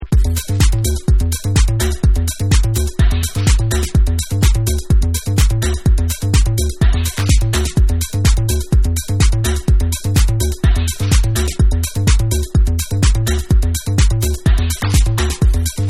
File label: Electric Mix